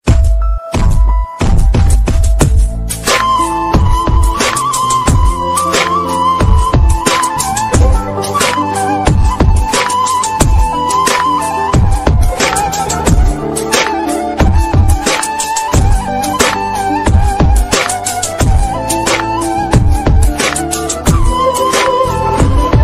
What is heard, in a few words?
zang sad